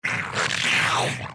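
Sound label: gargoyle attack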